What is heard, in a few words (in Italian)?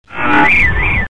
suoneria botton; beep